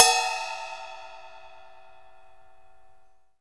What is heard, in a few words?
SPLASH12 BEL; Big Fish Audio; Ross Garfield Drums VOL-2; CYMBALS